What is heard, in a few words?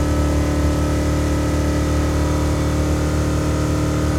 Truck Explorer Loop; Trucks